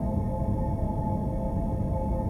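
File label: ui holograph ambience hivemind loop; Stellaris; paragon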